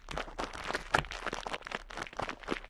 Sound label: mobs stonemonster; mobs redo